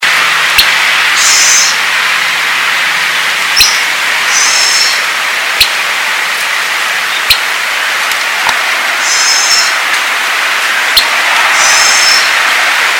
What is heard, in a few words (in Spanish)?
Fluvicola pica - Burlisto de agua